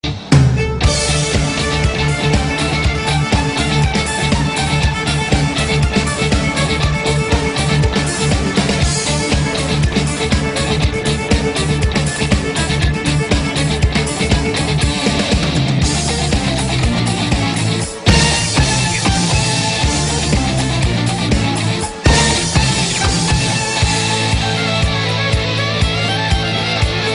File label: toccata fugue rock